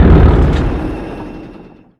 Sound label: Vehicle Engines; Red Orchestra Ostfront 41-45